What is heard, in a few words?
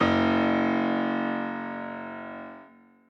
bass piano